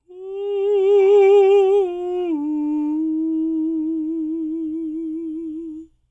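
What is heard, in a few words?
falsetto, Vocal Planet Jazz FX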